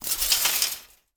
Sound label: FOLEY Metal Fence Shake Hard; mono; Universal Sound FX